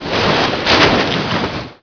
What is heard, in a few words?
ROLL